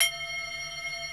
CLEAN LP-X delay, ARP, OLD SYNTHS; Rubber Chicken Software Sample Bank

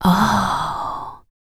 FEM. HUMAN, Vocal, R&B & Dance; ILIO; Vocal Planet VOL-4

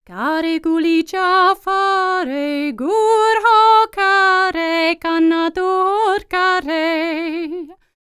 NEAR EAST, Vocal World Voices; ILIO; Vocal Planet VOL-5

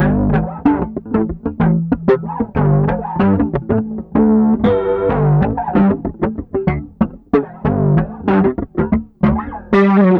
A, Bass, 94 BPM; Sampleheads; Ultra Freakin' Bass

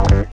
JAM SYNTH, KEYS

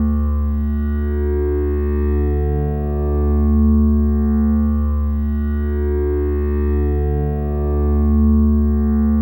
Lone Drone; Sonic Foundry (Sony Creative Software); Numina II

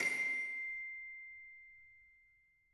celeste C7, Perc; M8; BBC

